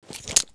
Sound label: knife deploy; cstrike